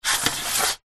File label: industrial joist metal small sliding extend